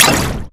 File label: imp bullet lightballistic bubbleshield; Titanfall 2